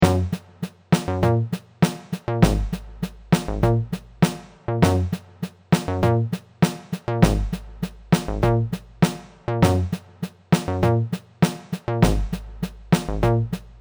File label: beats army dog